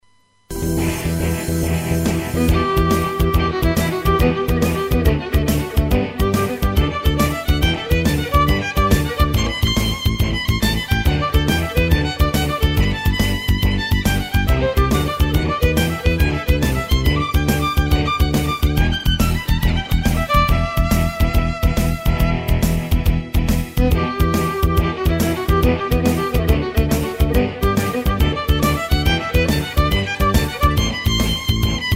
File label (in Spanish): Contradanza